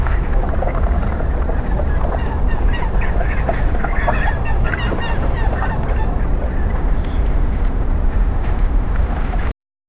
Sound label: cold arctic harbor ambien